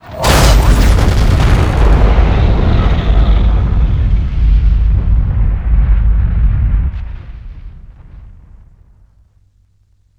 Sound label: magic